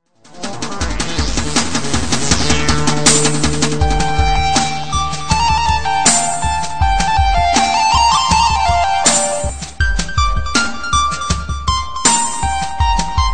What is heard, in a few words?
Tone Piano, Bgm